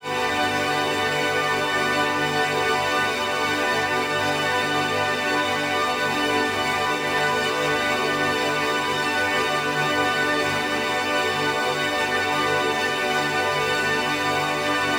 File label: granular